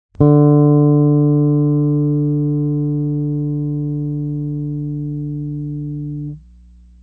tune d4, guitar

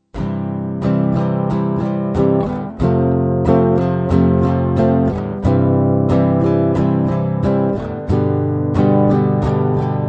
stroke, guitar

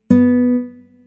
tone bm, guitar

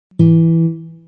tone e, guitar

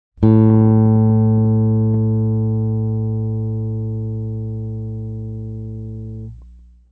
tune a5

